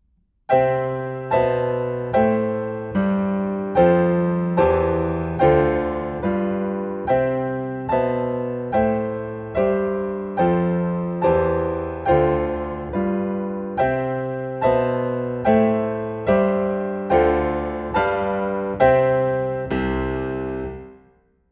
round and round live piano accompaniment; eyfs and key stage 1

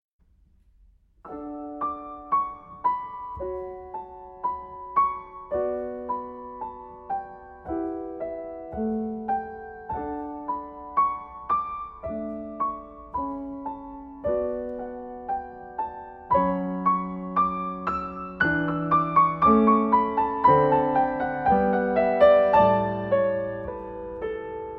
swallows in the sunshine live piano accomp; in harmony teacher resources; eyfs and key stage 1

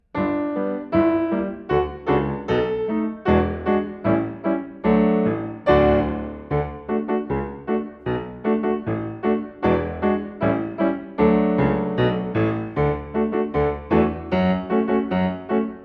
hello everyone live piano accomp; in harmony teacher resources; eyfs and key stage 1

